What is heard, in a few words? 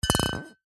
aerosol cans x2 deodorant bash together; household